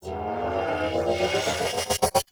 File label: Cart Turret Energy Ball Charge Up; Venus; Turrets